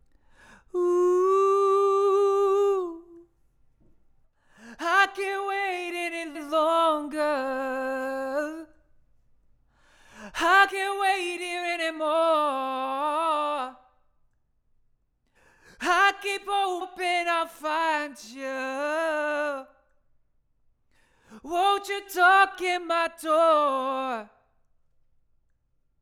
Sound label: Vocal